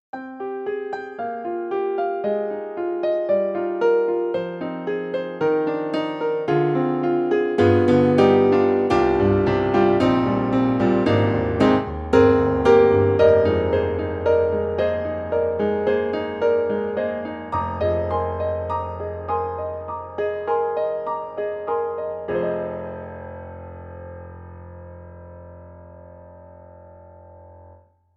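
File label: German New Age Grand, piano; KORG KRONOS 2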